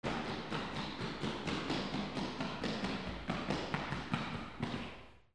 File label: FOOTFALL